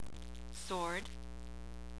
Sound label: SWORD